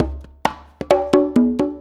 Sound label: Congas, Perc Loops; Drum Tools